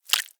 Specimen Liquid Drop; Medical; Blastwave

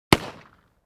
gun hand gun; NCH Library

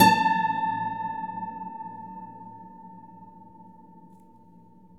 core string; Cinematic Metal Impacts

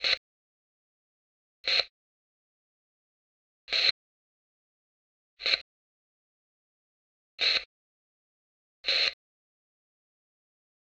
walkie-talkie static; devices accessories utilities; NS Studios - ultra sound collection

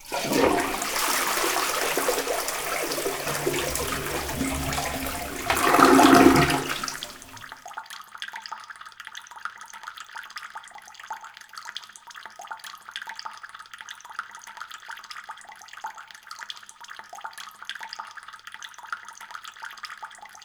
toilet flush; DeadFright

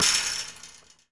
Street Ball Metal Rim Chain, Street Basketball; SFX Kit

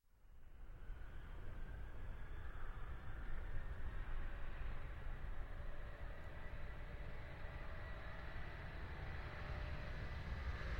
Large Jet Take Off; Sound Ideas; Series 2000

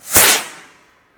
firework single; best service Blue Box

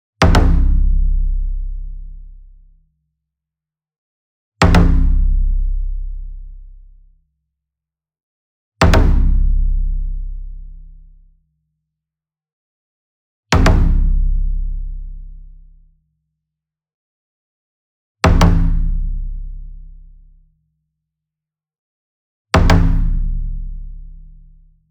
Single Drum Taiko 28 Inch Double Hard Beater Near; boom library; Cinematic Strikes Construction Kit

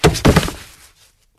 wood fall; firefight

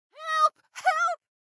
town human road; Blind Drive

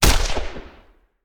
Machine Gun; Sound Ideas; Guns

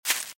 Velociraptor - Footstep - Grass - Run - Right

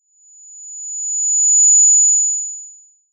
Microphone Feedback - Very High Tone; Hollywood Edge; sound designer's toolkit v2

